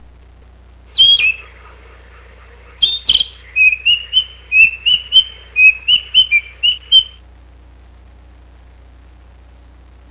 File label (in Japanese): kibitaki